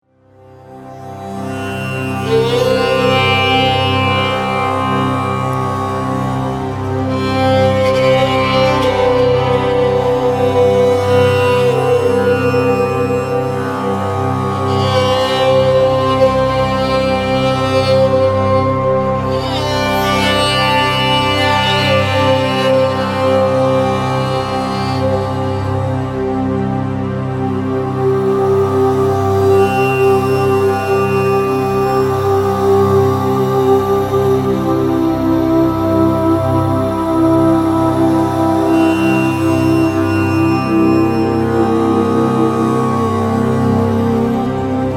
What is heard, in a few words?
Infinite Instrumental